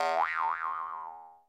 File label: Spring Jump; SMG